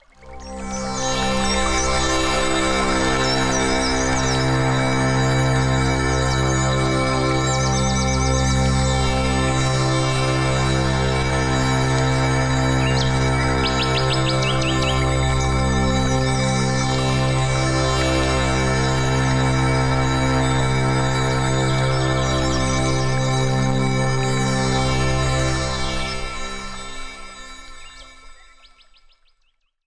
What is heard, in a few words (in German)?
ruhiger klingelton